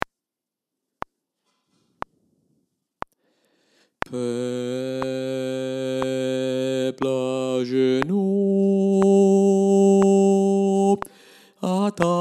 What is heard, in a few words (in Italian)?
cantique de noel, bassi